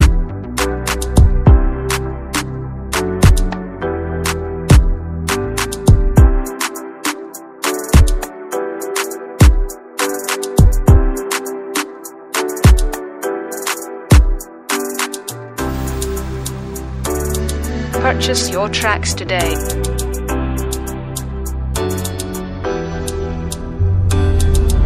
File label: afro beat x dance ringtone